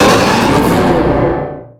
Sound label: pokemon cry